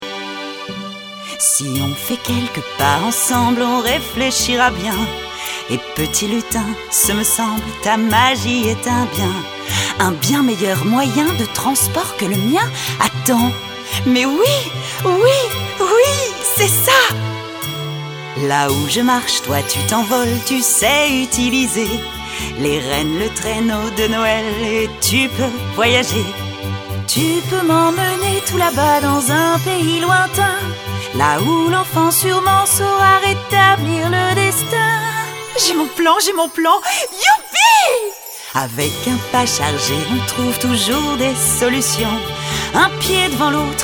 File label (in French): Chanson; Fée Sabotine - extrait du spectacle Père Noël 2.0